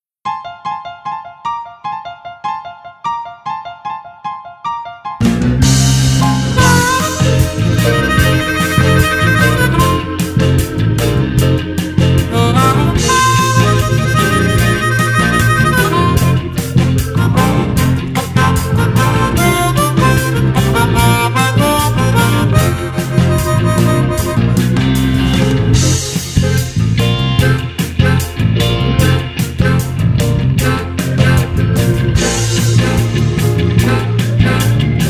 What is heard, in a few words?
blues in F gain